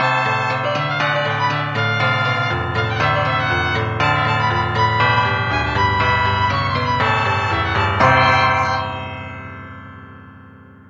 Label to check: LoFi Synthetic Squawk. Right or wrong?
Wrong